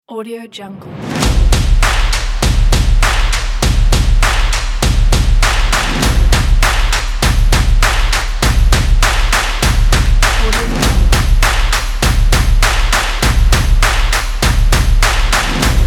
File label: stomp